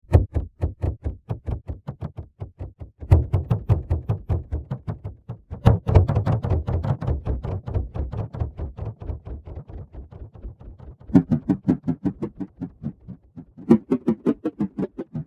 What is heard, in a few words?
Drum Patch